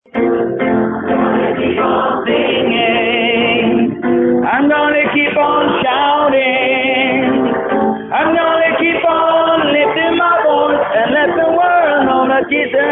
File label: tenor